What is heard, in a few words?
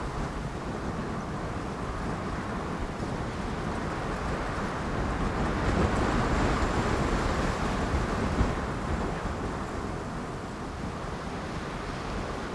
wind